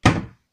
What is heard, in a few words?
door close